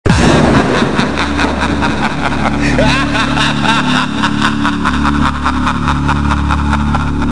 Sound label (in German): Jingels